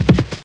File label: step; Necromancy